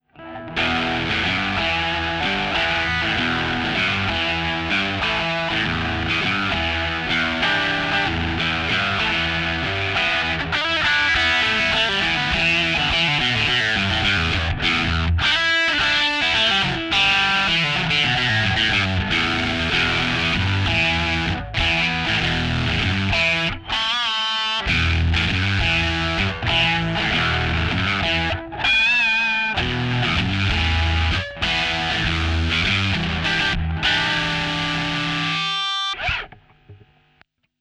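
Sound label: overdrive distortion; behringer; pedales guitare; overdirve distortion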